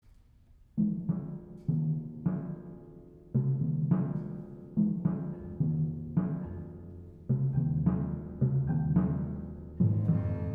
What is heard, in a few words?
Double Timpani Concerto